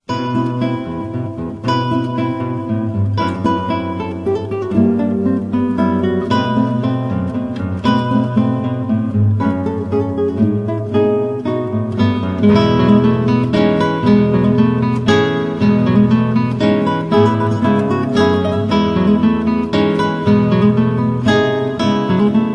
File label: 4 Guitars; four guitars